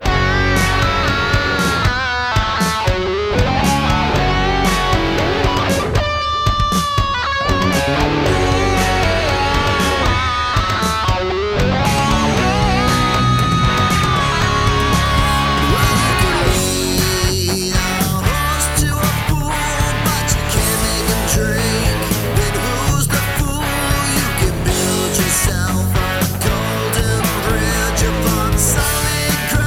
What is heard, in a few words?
karaoke full mix